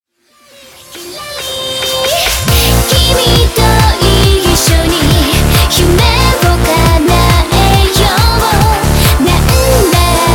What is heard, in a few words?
taiko